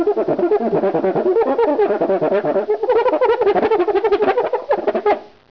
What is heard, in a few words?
remo cuica 5x5 inch